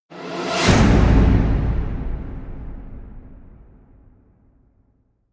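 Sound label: Hit Swoosh Orch Stab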